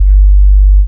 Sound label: Gang Sub, Bass; Jungle; Blu Mar Ten; Jungle - 1989 to 1999